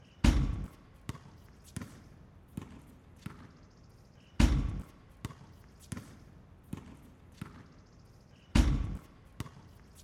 Basketball Exterior

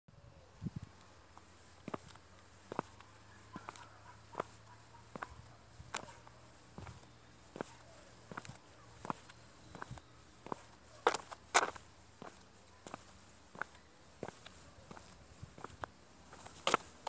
Shoes Walk On Asphalt With Occasional Drag

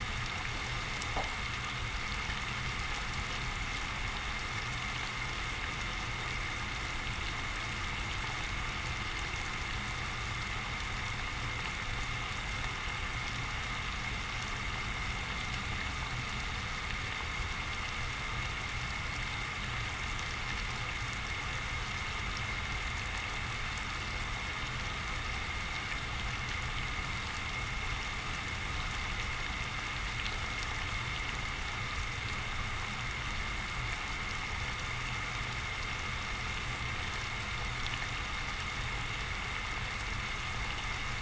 Water Boiling On Gas Stove

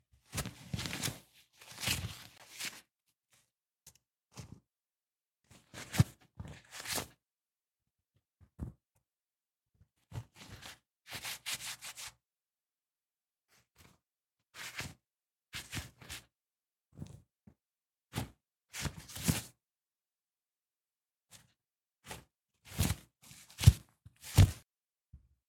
Fabric Rubbing

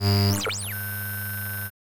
Sfx Blip

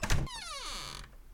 Treasure Chest Open

